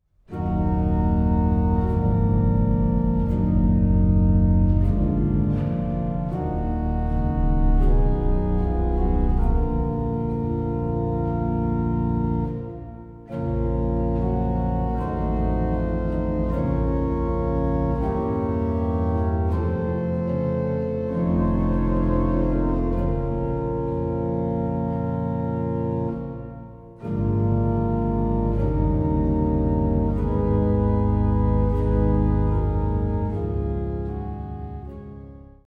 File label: organ